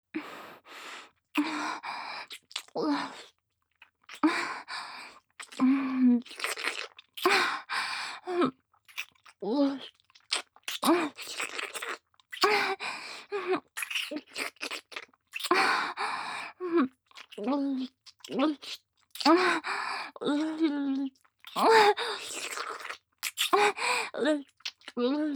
騎乗位しながらの乳首舐め; 乳首舐めループ

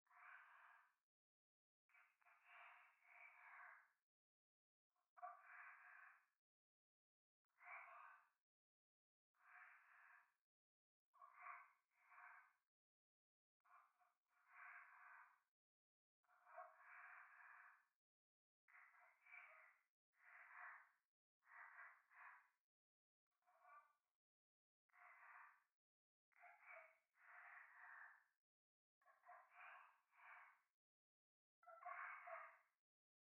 左耳 密着 処女 喘ぎ; 夢の中

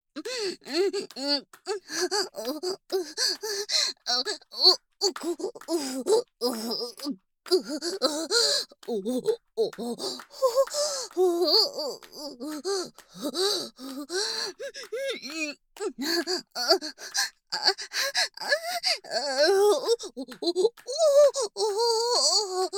オホ声; SEあり

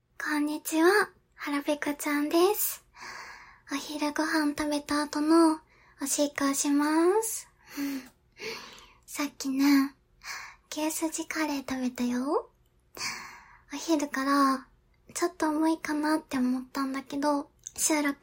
おトイレで！お昼ご飯の後の！オシッコですッ！

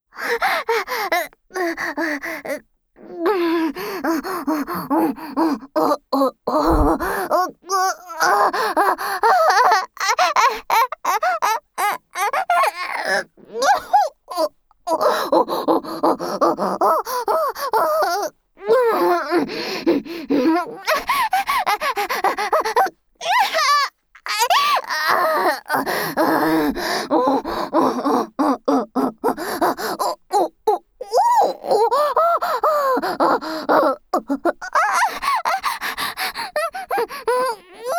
限界セックスの獣オホ声ループトラック

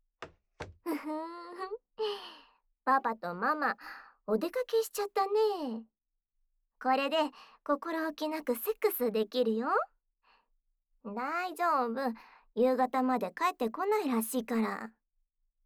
濃厚な喘ぎ声; 激しい初体験

